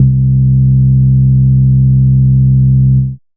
Moog Bass, Dance Synths

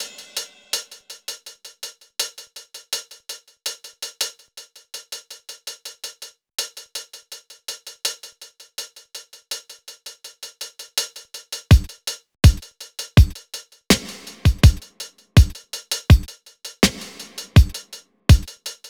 drums B, Smooth RnB; Big Fish Audio; Legendary; Shes Mine

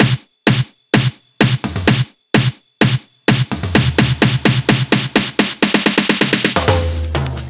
Fills 128BPM, Clubsounds

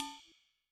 ride, Cymbal; Yamaha DTXpress-II

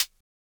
closed HH, Univox SR-95

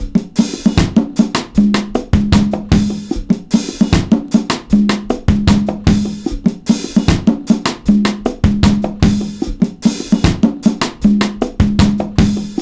76 bpm, break; ONE DROP; 90 s Sample CDs; Planet of the Breaks

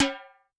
Timb H, Yamaha DTXpress-II, Perc

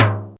TIMBALE LO; Back In Time Records - Big Bang

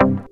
HIT, STABS, House

